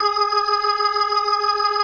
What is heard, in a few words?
B3, First Call Vintage Keyboards